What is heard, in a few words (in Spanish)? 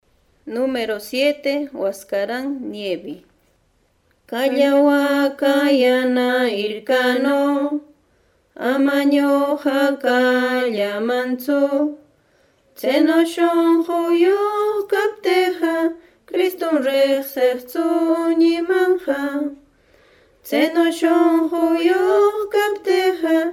cancion